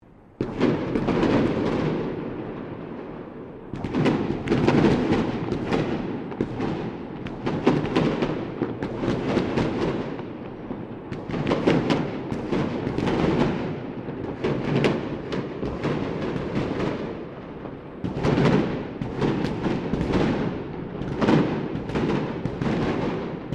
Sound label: Ambience Fireworks Echoing Of Buildings, Fireworks in City area recorded from a distance; Firework Sound Effects; Foundation1